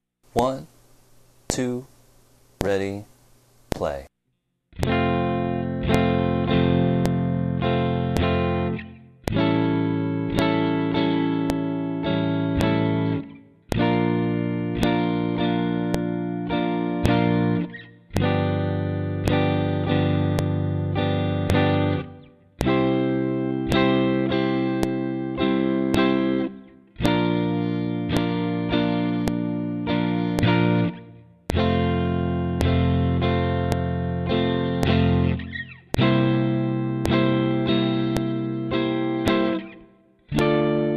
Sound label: slow speed